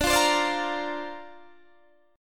D-Minor 7th Sharp 5th D Guitar Standard, chord; Dm7#5; strummed

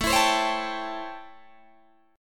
A 7th Sharp 9th, Guitar, Open D

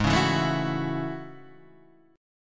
A Major 13th, guitar

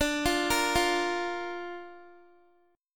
D Minor Sharp 5th D Guitar; arpeggiated; x,17,17,15,17,x; 4F,4A,5C,5G,5C,6E; Dm#5

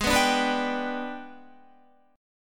G sharp Minor Major 7th Flat 5th, Guitar; strummed; JGuitar

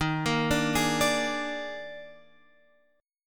Eb Major 7th Suspended 4th Eb down Guitar Open F; arpeggiated

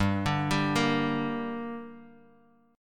G Minor G, Guitar, DADGAD; chordshape; down

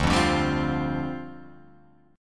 D Minor 11th D down Guitar Open F, Dm11 chord; Dm11 strummed; JGuitar

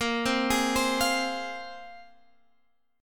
Bb 7th Suspended 2nd Bb down Guitar Open G

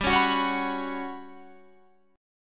G Major 7th Flat 5th

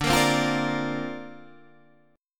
C 7th Suspended 2nd Suspended 4th D

